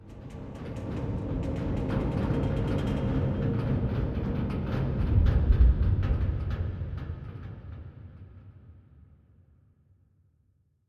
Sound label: submarines engines; World Of Warships